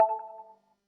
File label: submission Fail